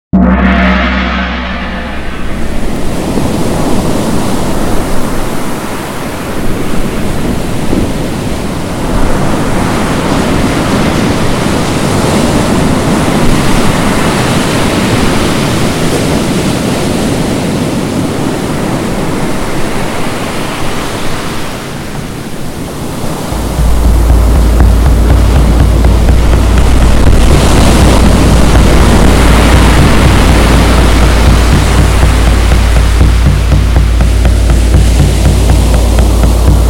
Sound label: Theta Ocean